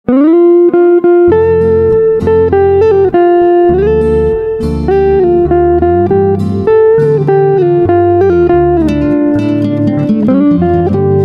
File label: Guitar